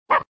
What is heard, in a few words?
arf